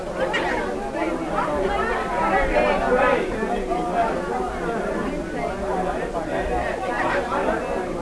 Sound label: bar break; spacebar